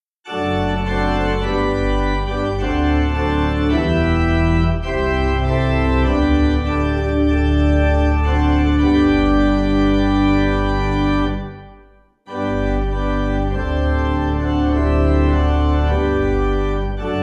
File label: Pipe; Sing My Soul; St Bees